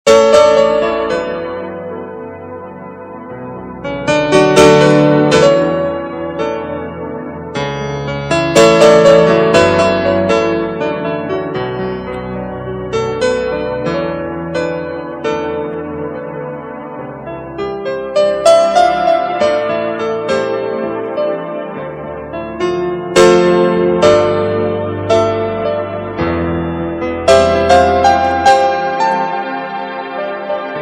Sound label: piano intro